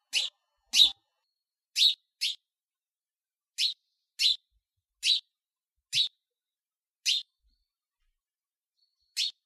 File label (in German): Vögel; Pfugstrasse 16 Schhlieren